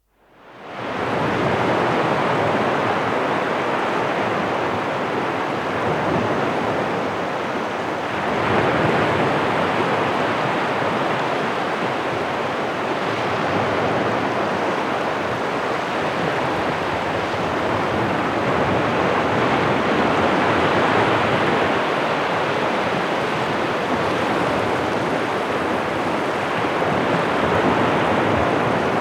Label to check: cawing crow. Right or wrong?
Wrong